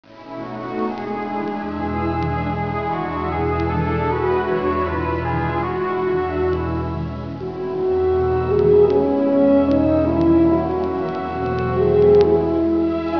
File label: firebird